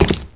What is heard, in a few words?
step, footstep, pave; SINDEMO